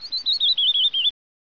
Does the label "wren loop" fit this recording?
Yes